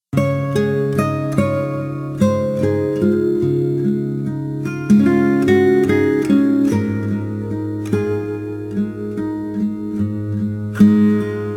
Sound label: korea; เพลงญี่ปุ่นเกาหลี